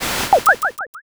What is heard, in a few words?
gacha roll